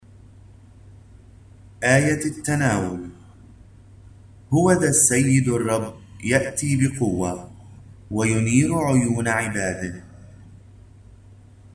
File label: Communion Antiphon; Wednesday of Advent